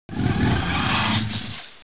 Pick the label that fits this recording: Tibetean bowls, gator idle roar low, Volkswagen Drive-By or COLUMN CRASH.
gator idle roar low